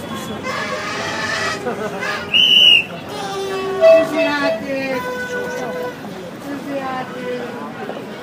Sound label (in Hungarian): varosi hang (szilveszter)